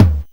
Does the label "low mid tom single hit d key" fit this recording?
Yes